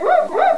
Woof!; Josh's Apple Game 1.0.1

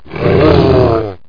ROAR; landslor

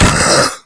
FALL; QUAKE2; cyborg

